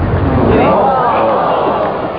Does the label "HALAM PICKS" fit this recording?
No